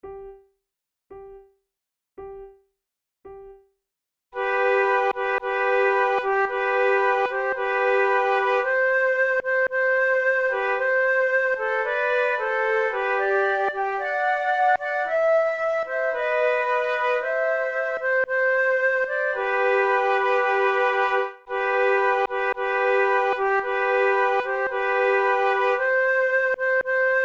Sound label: trio